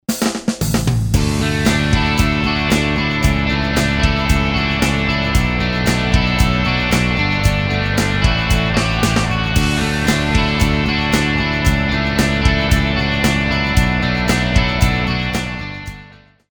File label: rock